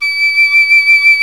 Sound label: piccolo